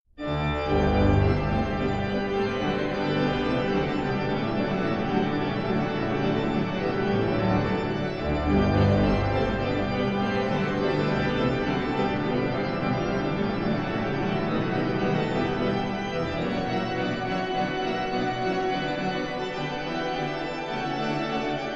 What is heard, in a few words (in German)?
Toccata G-Dur